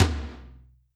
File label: Roland R-8 Power Tom